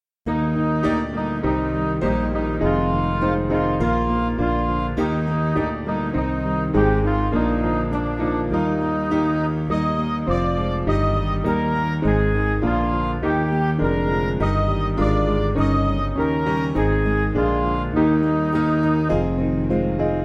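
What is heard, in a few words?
Saviour Breathe, Vesper Hymn, Midi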